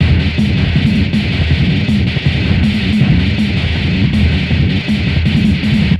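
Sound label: Master Loop, beats; Sonic Foundry (Sony Creative Software); Esoterik Beatz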